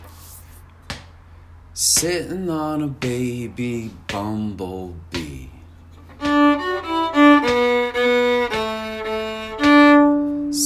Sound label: Bumble Bee